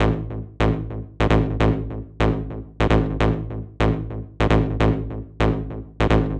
Bass 150 Gbm

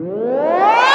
Vocal Reverse (E)

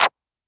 Clap

